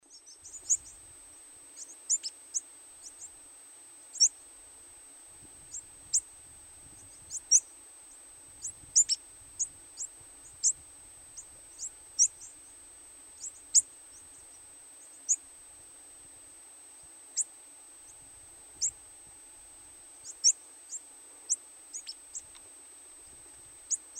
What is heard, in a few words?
diuca; quelccaya